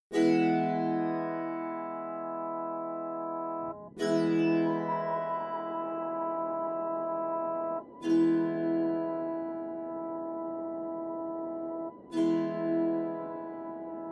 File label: Sitar